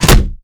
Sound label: riot shield impact; weapons; melee; Modern Warfare